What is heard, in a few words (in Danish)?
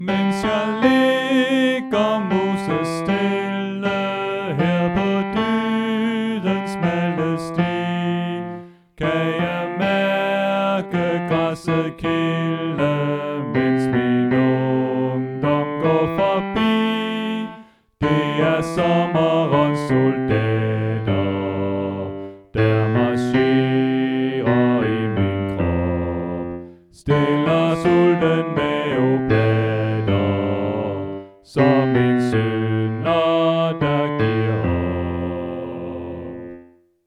Bas; oevefiler